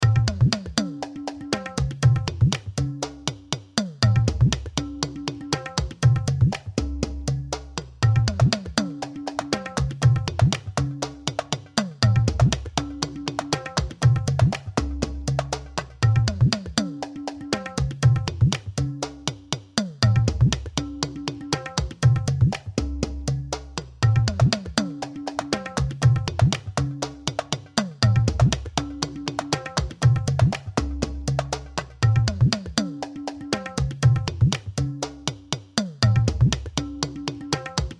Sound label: Tribal Ethnic, Tribal Ethnic Rhythm Tabla Hand Drums Loop; Musical; Blastwave